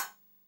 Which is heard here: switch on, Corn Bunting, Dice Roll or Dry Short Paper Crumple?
switch on